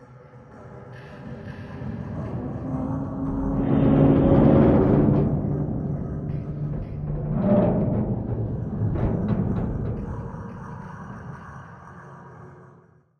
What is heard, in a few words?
Creak; Damage